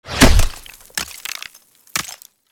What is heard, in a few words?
Ice magic spell small impact; fantasy